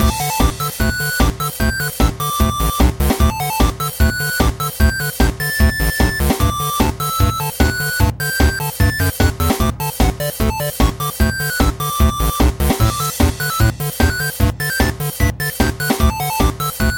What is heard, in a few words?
Battle Win; he is the richest man among the four heavenly kings syupro dx; android game music